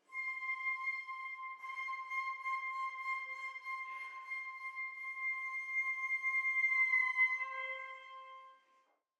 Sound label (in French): rires grincants flutes hautbois; Berlioz; songe nuit sabbat